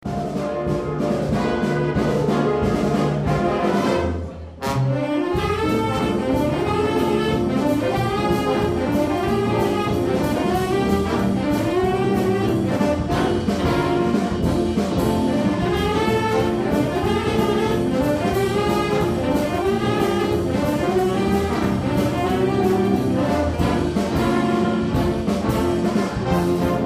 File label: Boogie Big Band